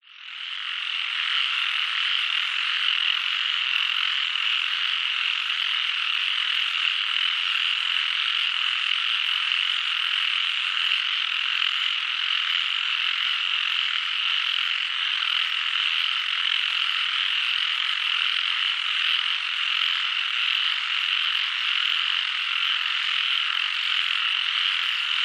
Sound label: frogs chorus doi suthep national park thailand